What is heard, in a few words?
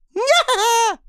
laugh; Racetrack Riches; megaboard